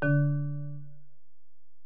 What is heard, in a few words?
Bell, Alesis DM-PRO